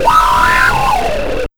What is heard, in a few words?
FX, Big Beat Drumloops; PHASE 130; USB Soundscan vol.25; AKAI